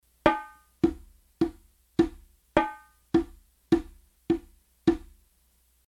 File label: example rhythm no metric